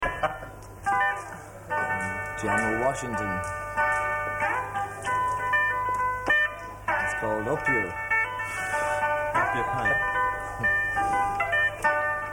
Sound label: Improvisation